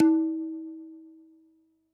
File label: DJEMBE, Yamaha DD-65